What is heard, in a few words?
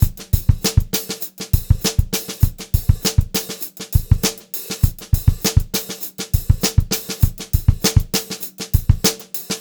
VAR, Funk-Groove Drumloops; USB Soundscan vol.38; AKAI